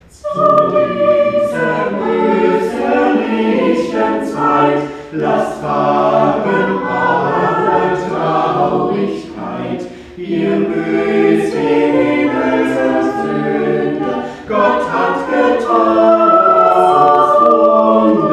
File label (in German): Chor Zu dieser österlichen Zeit; Eccard; Gottesdienste zum Nachhören; Osterfestgottesdienst